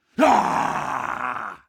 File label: scream long, combine soldier; hl2